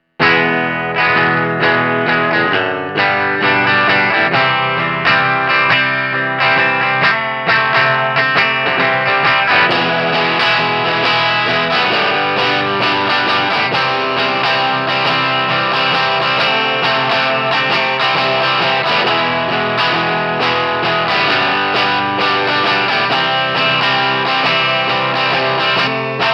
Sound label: Mid gain; Balls Deluxe